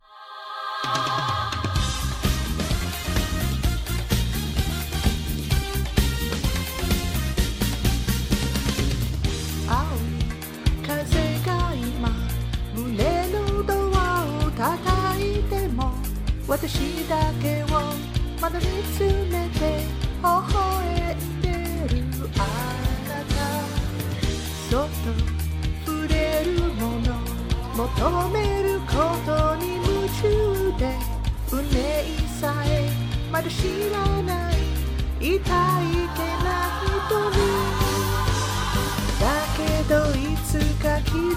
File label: [Backing]; Neon Genesis Evangelion; TV Songs